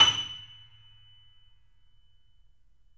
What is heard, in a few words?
F#, 3-D Audio Collection, 3D Pianos, Yamaha C7; E-MU Producer Series Vol. 5